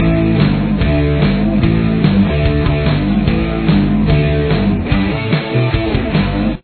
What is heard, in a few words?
riff, guitar